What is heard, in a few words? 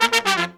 120BPM, Horns; 90 s Sample CDs; Zero-G; Phantom Horns